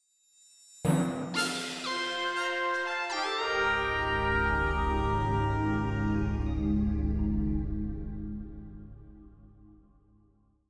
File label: Landing in a New Area (Short); Pikmin 3